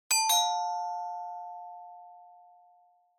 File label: Doorbell Fake